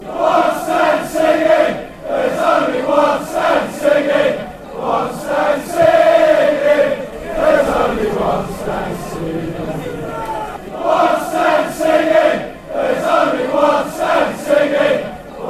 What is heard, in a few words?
one stand singing fanchants